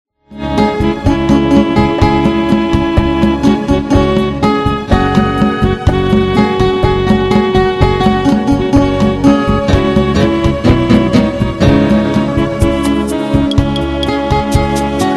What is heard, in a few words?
latin guitar with sax